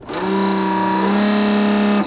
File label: yacht toilet flush; prosoundfx